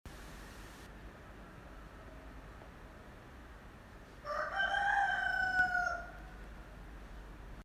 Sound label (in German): Hahnenschrei